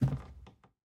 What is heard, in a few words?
Footstep Lab